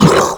Flying Mouth Pain; Warframe; ManInTheWall

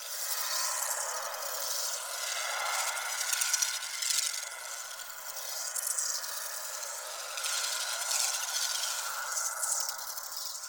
Void Loop; Warframe; Focus; Operators; Operator Wraith Movement

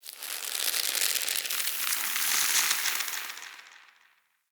Under City Vine Creak; Ambience

